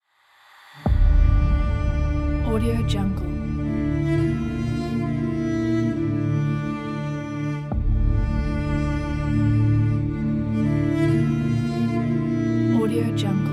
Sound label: sadness music